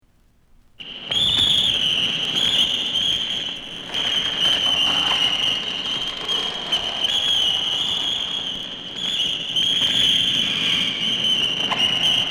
Flying Bats; Halloween; Haunted House

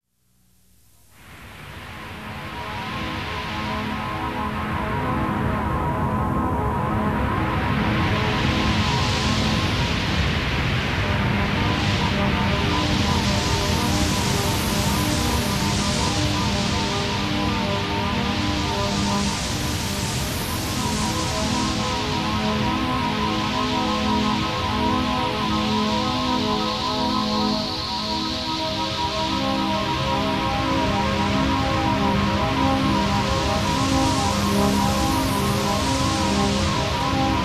Mysterious Synth; Halloween; Hallmark Halloween 1989

